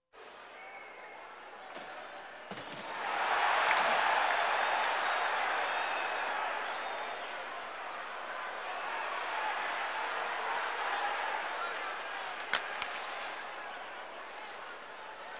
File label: Sports crowds